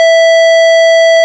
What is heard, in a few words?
ORG Farfisa